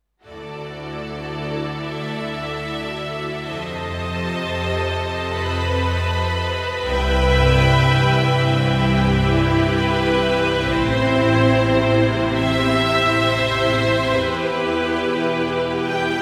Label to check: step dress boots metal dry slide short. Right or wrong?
Wrong